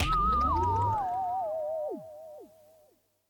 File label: Count Rewind